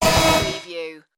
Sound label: robot servo malfunction